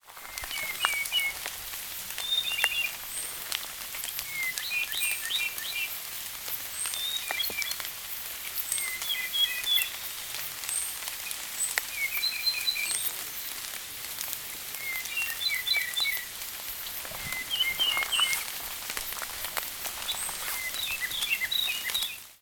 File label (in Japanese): akahige, bird song; アマミアカヒゲ; さえずり②; 鹿児島県大島郡龍郷町 自然観察の森